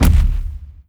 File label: bass soft, zombie blind step; Half Life Alyx; creatures